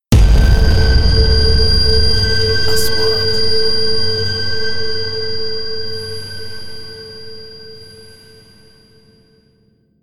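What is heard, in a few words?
3D SFX Trailer; مؤثرات تشويقية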